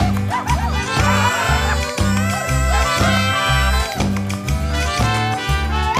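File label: food world music inferno suspense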